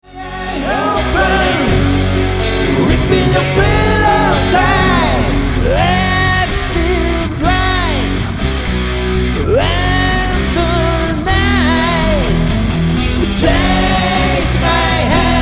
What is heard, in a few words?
Drums